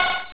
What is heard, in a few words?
shoot; javanoid